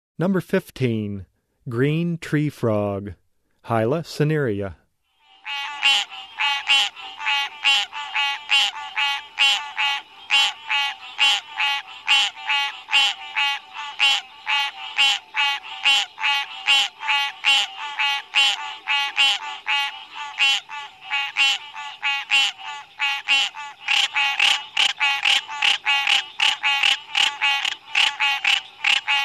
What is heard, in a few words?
Hyla cinerea - Green Treefrog, Frog Calls; Herpetology; Bio227 - Herpetology